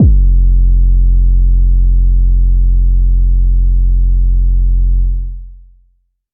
phonk kicks oneshot - 808 f, Specially designed for phonk type beats, these nasty, layered 808 one sho; kick drum